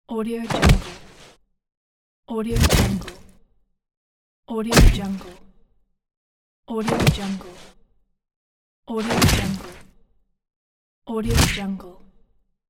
cinematic punching sound effects

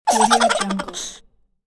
cartoon laughter sound

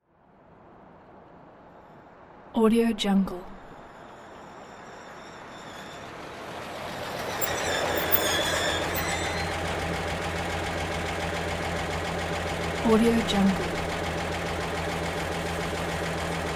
vintage car pulls up from left medium speed; sound-ideas

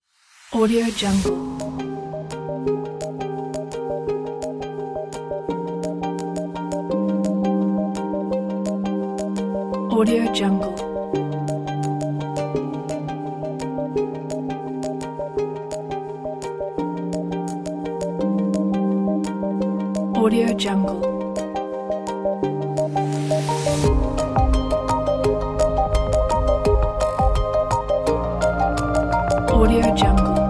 medical background

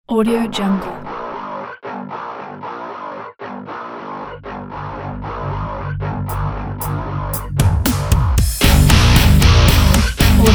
energetic upbeat indie metal

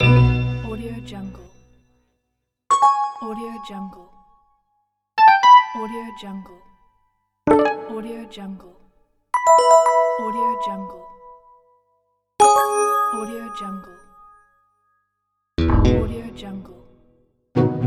user interface app sound fx

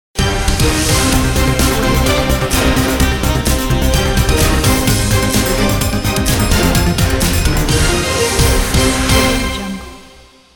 intro breaking news logo

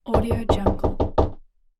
door knocking

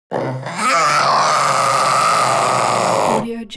panther young roar long; animal; smartsoundfx

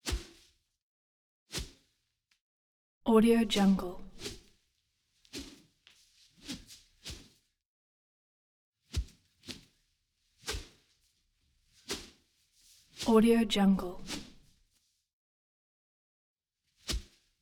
int whooshes thick rope; collanderson

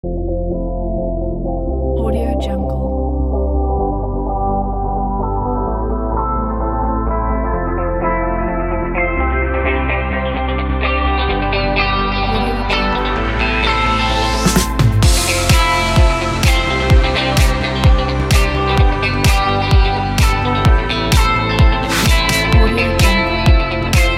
background hi tech electronic; tanenmusic

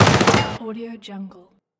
machine gun burst

